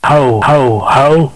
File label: mouse hohoho